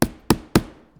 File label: attach Static; Kerbal Space Program; KIS